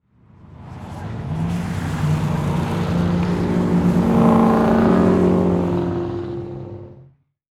Transportation Car Race Car Drive By Turn Into Straightaway